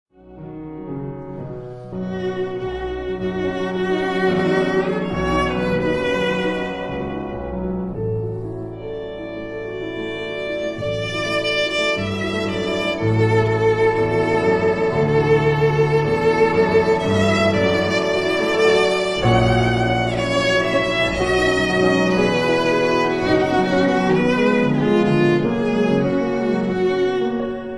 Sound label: for viola & piano; Visages; Op. 238; La Bruxelloise